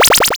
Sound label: Powerup